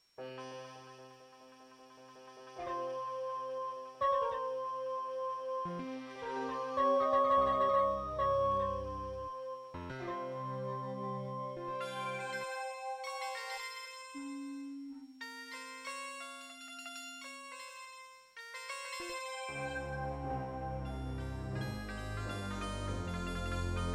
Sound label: Labway LWHA111800 (AdMos QS700); Prince of Persia 2 - Intro